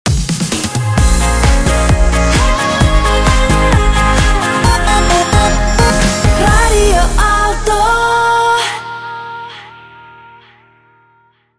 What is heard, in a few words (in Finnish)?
Jingle; Tuotanto Aalto; Radio Aalto